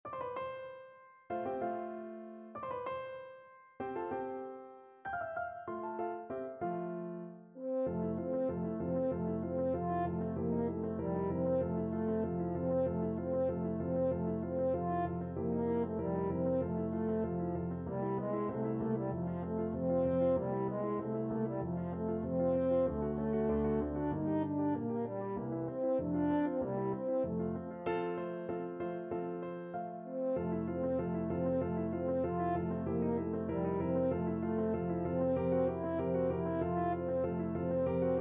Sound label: schmetterling, french horn; schubert